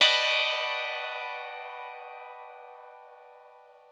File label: sitar drone hit